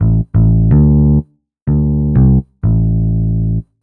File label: DEEPHOUSE BASS 125 A